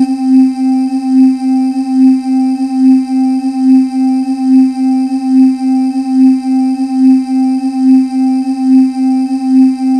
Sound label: Chor, Yamaha DTXpress misc voices